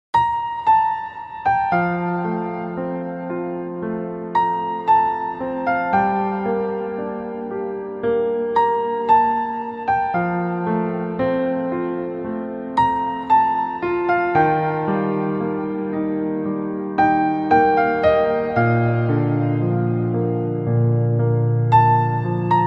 emotional piano ringtone

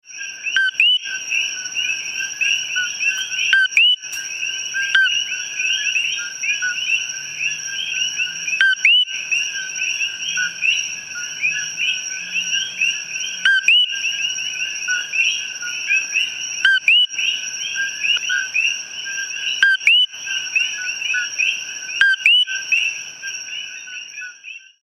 coqui ringtone

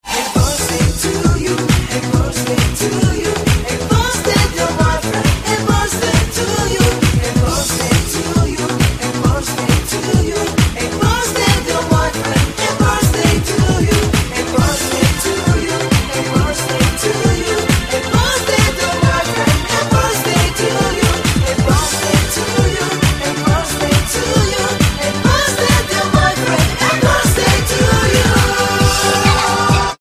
happy birthday song

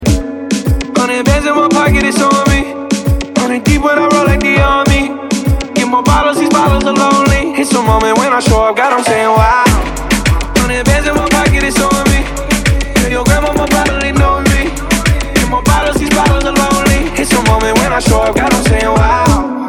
moombahton remix

